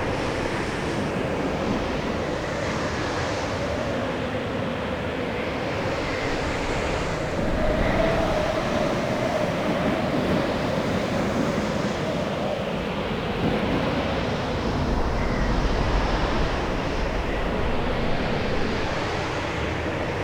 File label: atmos blizzard intense